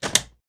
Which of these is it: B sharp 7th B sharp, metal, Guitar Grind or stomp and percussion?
metal